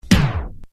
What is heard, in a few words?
stop, vinyl